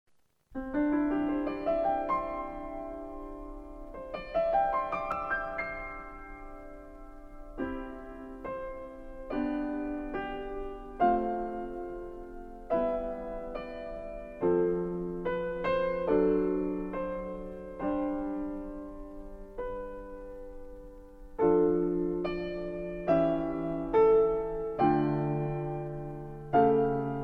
Piano Version; Final Fantasy

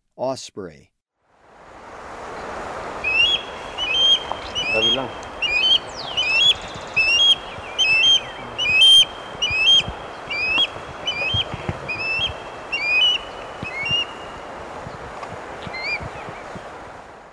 Osprey, Ecuador; S. America

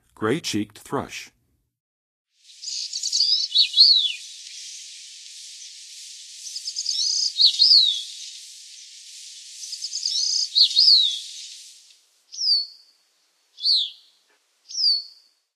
Gray-cheeked Thrush, Songs of Birds; North America; Western USA; Western USA Songbirds

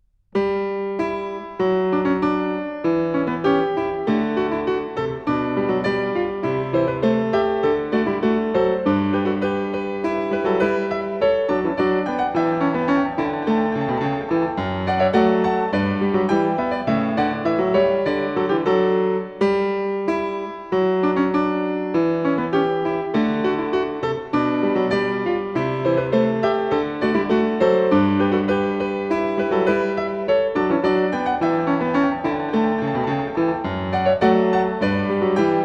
Variatio 22 a 1 Clav., Alla breve, Piano; JS Bach - Goldberg Variations

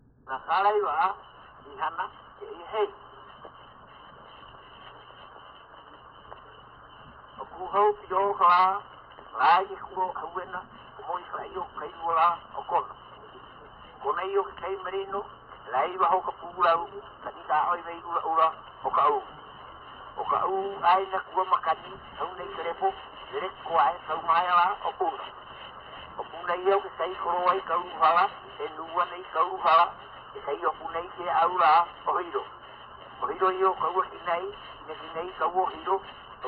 String-figure chant; place-name chant, Hawaiian; World Music; Na Leo Hawai'i Kahiko - Voices of Old Hawai'i

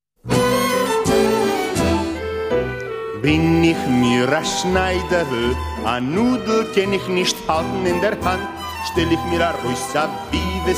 Klezmer, Yiddish folksongs; Hebrew; Bin ich mir a Schneiderl

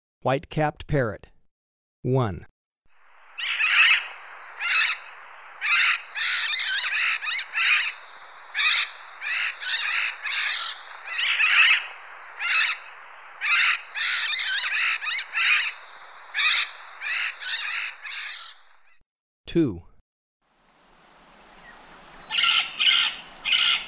White-capped Parrot; S. America

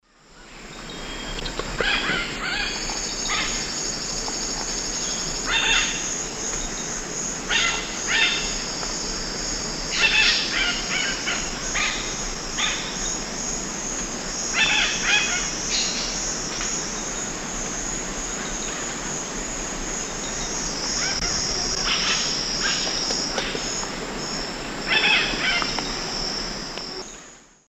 Touit purpuratus, group calls; S. America; Eastern Lowlands

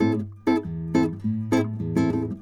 Guitar A, Acoustic

